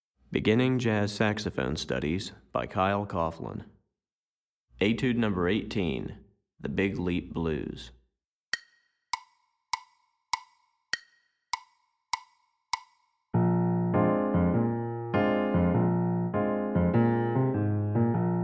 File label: Piano Part, Beginning Jazz Saxophone Studies Alto